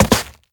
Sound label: Stone Jump